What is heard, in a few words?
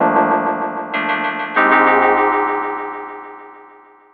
Electric Piano, Loops, Keys